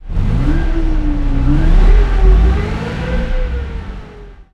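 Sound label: centurion engine interior load, tanks; War Thunder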